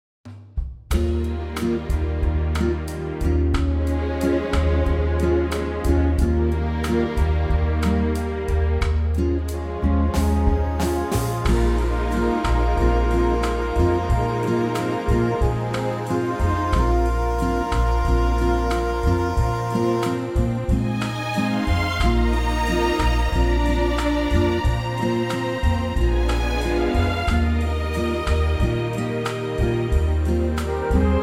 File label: Eb ballad